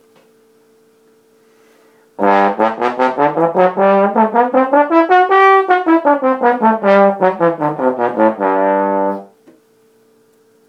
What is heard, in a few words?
trombone